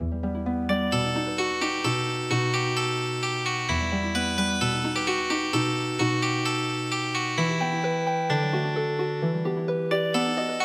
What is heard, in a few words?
Healing Harp